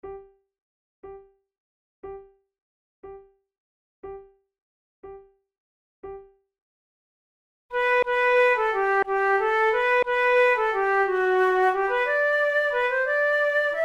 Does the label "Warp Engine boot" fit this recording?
No